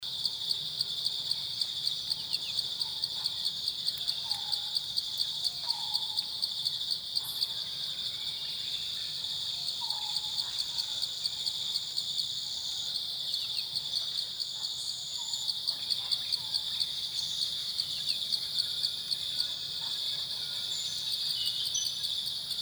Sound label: jungle birds and insects loop